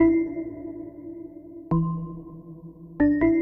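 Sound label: synth electro 140 A; Techno; Keys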